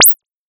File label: hd chirp lin; 192k; 3dBFS